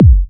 Bassdrums Clubhouse; Vengeance essential club sounds-3

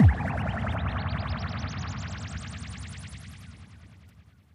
bassdrum reverb FX kick; Vengeance Samples

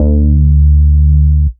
Bass D, Root D

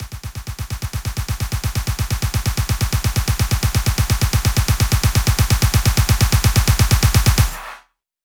128BPM Style Control, House Electro Fills; 4Bars; Vengeance Samples

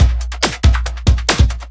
Breakbeat; Loops; Vengeance Samples

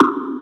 Bassdrums Hard Kicks; Vengeance Samples

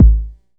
Sub Perc, Bassdrums; Vengeance Samples

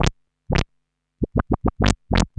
Arp D Line, ARP Odyssey; musicradar-synth-samples

